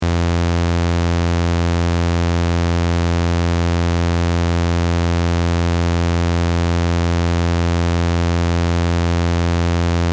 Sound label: paper synth clean test